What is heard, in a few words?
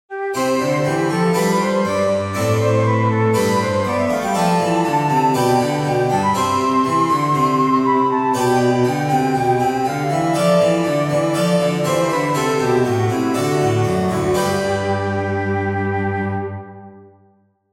Flute sonata